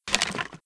Skeleton Ghost Attack; Playable Tutorial Level

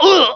Monk Death; Playable Tutorial Level; TR2

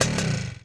Vehicle Motorbike Stop; Playable Tutorial Level

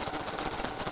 Locusts Loop; Playable Tutorial Level

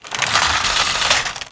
Exhibit Roller Blinds; Playable Tutorial Level

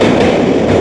Tube Loop; Playable Tutorial Level; TR3

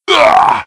Hit; Mafia

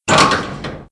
Locker Open; Playable Tutorial Level

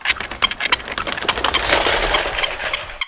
Cranky Grape Crush; Playable Tutorial Level

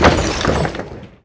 Train Decouple; Playable Tutorial Level

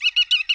Compy Death; Playable Tutorial Level; TR3

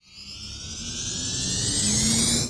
Generic Energy Charge; Playable Tutorial Level

